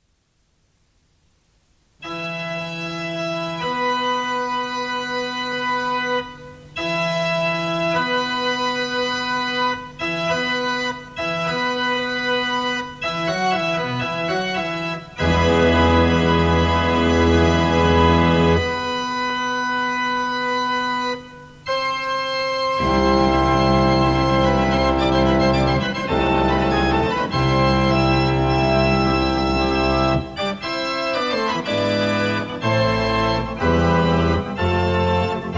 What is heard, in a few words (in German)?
Konzertorgel; Müller's